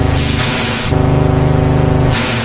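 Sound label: child factory ambience; hl2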